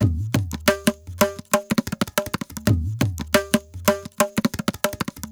ETHNO PERCUSSION 90; ethno2

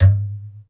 MARIMBURA; drum

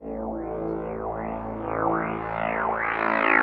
TEKNO 140, Techno; Techno2; Sphere

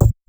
perc; Anthemic.Electro; drum hits

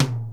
TOM, drum

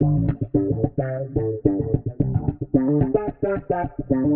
Warped Bass, 110 A; Loops; Smoovy; Touch Loops; CosmicFunk

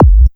HOUSE BD; drum; BASSDRUMS

